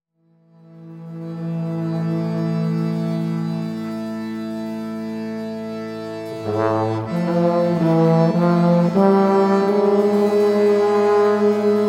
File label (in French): murmure